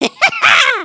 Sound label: soccer; emotes